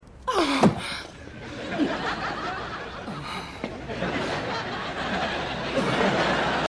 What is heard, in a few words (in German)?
Laute; Mrs Enright; Seinfeld